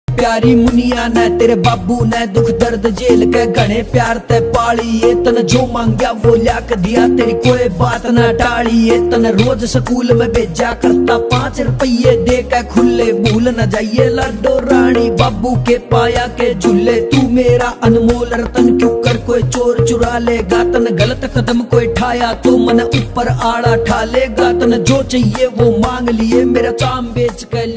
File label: haryanvi ringtone